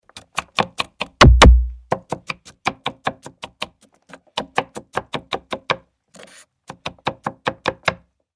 Chopping Vegetables, kitchens

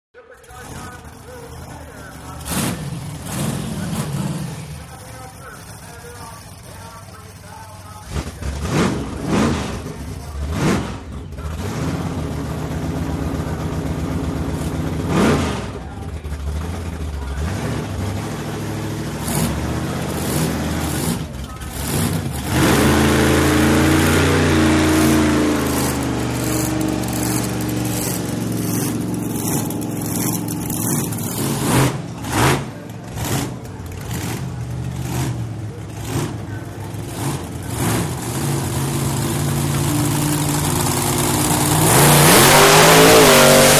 Monster Trucks, monster

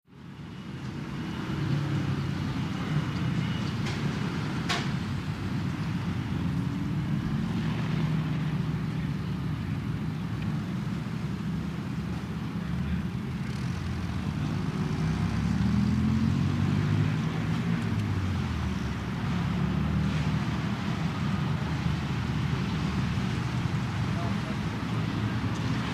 Traffic Rural Town BG

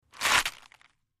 Breaking Tearing; punches kicks hits bones

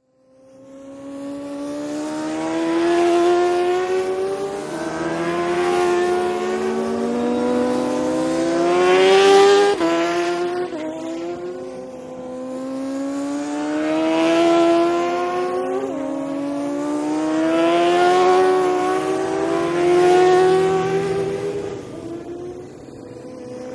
Motorcycle Road Racing

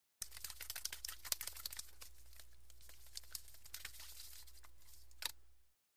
Fishing Fish Flippi

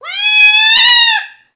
Cat Distressed Wail

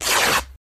Cloth Rip Medium

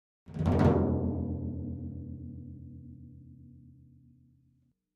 Timpani Hands Roll; mallets timpani

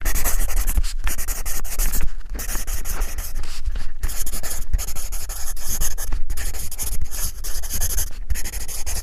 pencil writing

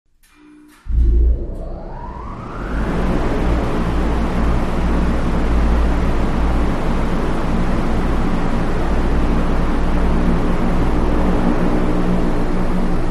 Power Up Large Wind T, factory

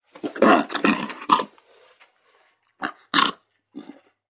Lion Cubs Playfighti; big cats

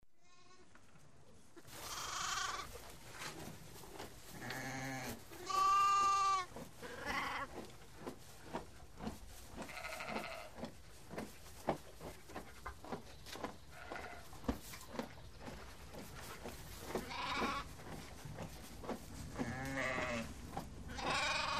Sheep Baas Horse Wh

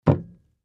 Beer Mug Thump Clinks; mug drinking

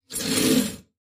bowsaw stroke

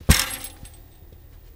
Drop Bike Wheel

